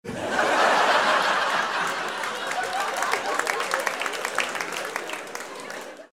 laugh applause

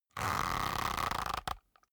leather creak soft

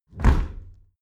window close bang distorted

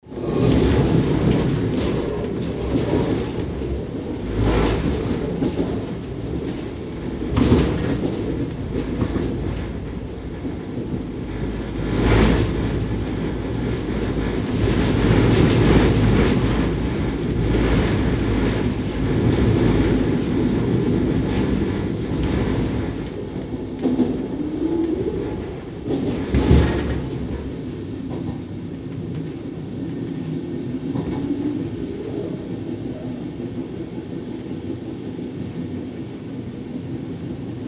regional train stopping cabin inside

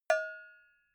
cowbell hit soft

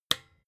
desk lamp on

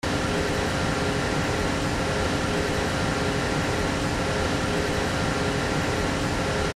filling station steady atmo

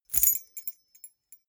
key ring small rattle air hard

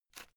headphone extend single short

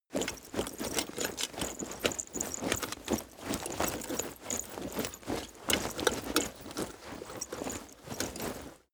leather metal movement hard

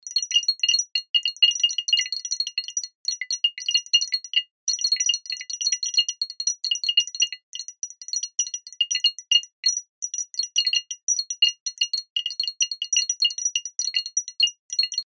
grain

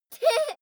child laugh short

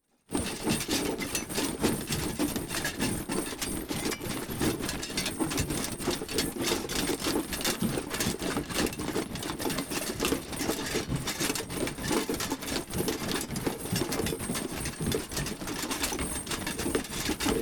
troops metal clatter run

